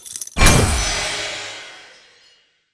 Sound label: pendant on; Golden Crayon Sounds